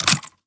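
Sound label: Reload Movement; Valorant; ares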